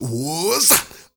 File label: MALE SOLO, MALE NOISE, Heart of Africa